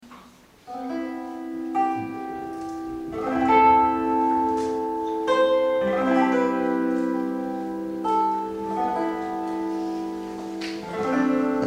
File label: kokle